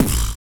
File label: SLOBBER; Spectrasonics; Planet